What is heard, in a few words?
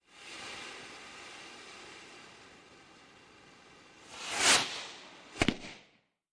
Rocket Flare Gun: Slow Launch, Fire By And Explode In Distance. Stereo; small rockets